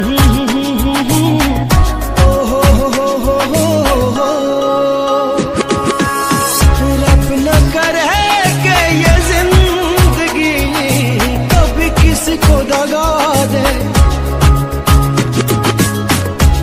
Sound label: beautiful sweet ringtone